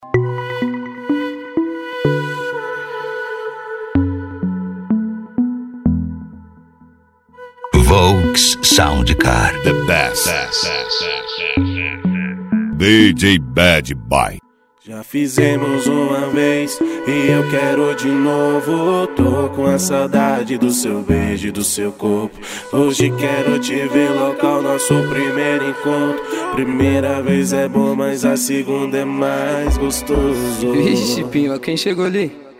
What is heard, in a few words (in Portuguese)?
sound car hip hop nacional; cd volks sound car